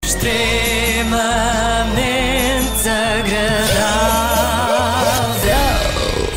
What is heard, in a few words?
Sing